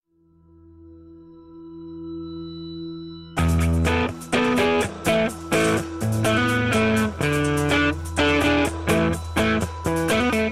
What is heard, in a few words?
music, ringtone